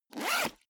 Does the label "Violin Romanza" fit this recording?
No